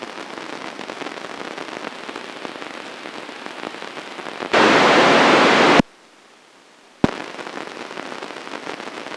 noise 10MHz

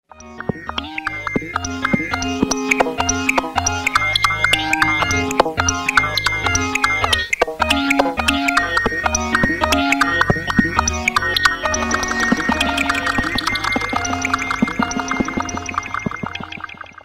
Minisyn

